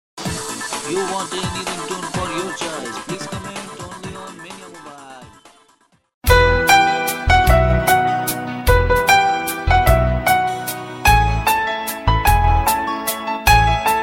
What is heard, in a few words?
piano